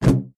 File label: foley balloon inflated throw with fingertips; zapsplat